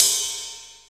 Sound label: CYM RIDE, Dance Kit, Roland; 90 s Sample CDs; Sound & Vision - Gigapack I CD 1 (Roland); Dance-Kit 19